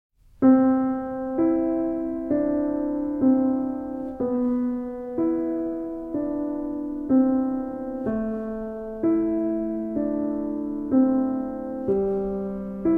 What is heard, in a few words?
Bells, Grand Piano